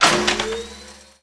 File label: Gun Draw Select Scifi, Weapons Foley; SFX Kit